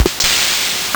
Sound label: Nintendo Gameboy LSDJ DR-55